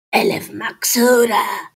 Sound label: child